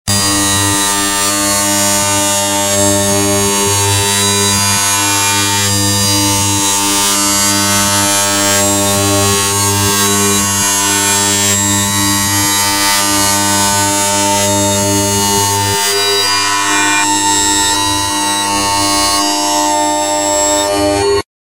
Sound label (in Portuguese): alerta de choque